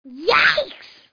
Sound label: boy ike; Microsoft Graphics Studio Greetings; Microsoft 1998